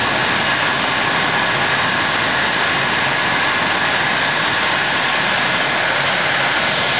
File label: ec135 turbine idle out